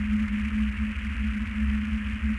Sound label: ambient